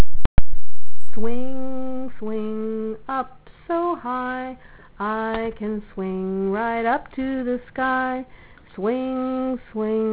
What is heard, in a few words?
original song